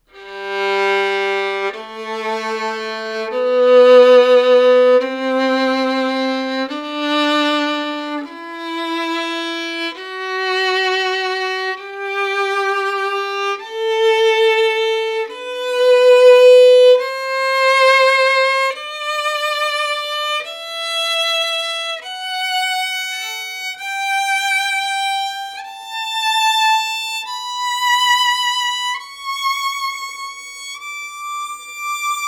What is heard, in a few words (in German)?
Tonleiter, Geigenbau; Violins; Sacconi